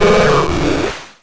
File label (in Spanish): Grito de Magikarp